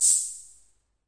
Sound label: vox hh, Vox AC1 RhythmVOX; drum machines